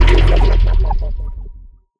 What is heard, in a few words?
player bounce jump paint